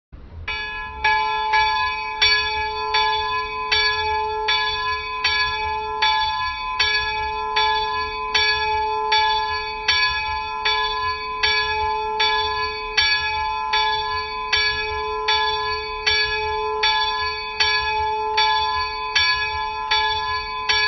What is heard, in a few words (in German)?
Glocke